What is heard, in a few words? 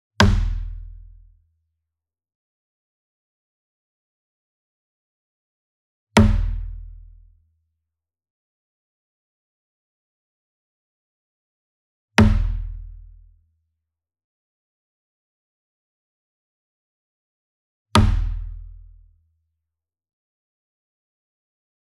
SINGLE DRUM Kodo Hit Hard Beater Near; boom library; Cinematic Strikes Construction Kit